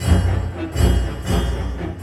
Orchestral Loops; 128 STRAVNSKY

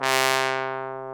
BONE, Trombone; Solo Brass

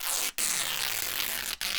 Canvas Bag Rips

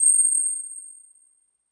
Pin Chime, Roland SPD-S

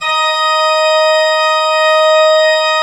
D4, Cathedral Organ, MANUAL PLENO; Propeller Island

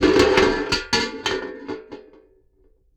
Tin Bucket, contact mic, Metalics

